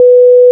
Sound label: countdown; mansion